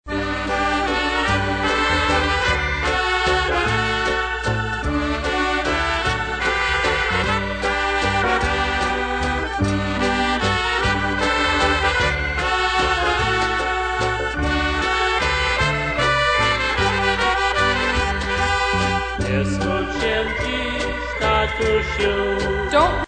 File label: waltz vocal